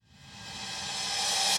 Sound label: Cymbals Reverse Crash; Vengeance Samples